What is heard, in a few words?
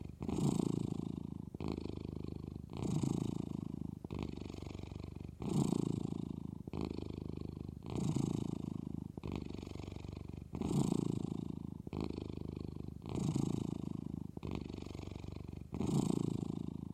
cat purring